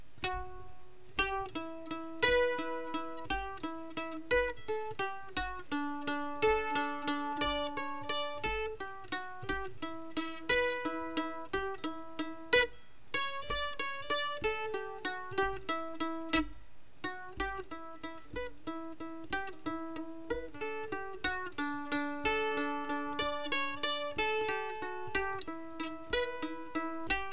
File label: swallowtail jig